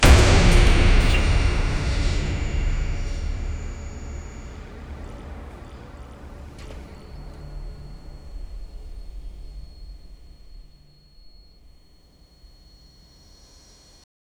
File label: mechanical ambie, machinery